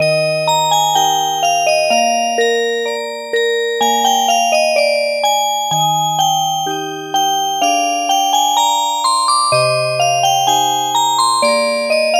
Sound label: Music Box